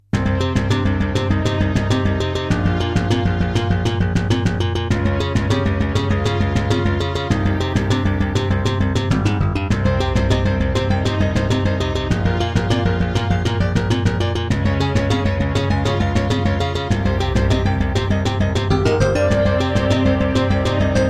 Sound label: Terratec MiniWaveSystem WT64; Hocus Pocus